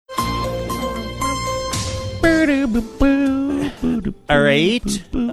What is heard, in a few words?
singing along with theme saying alright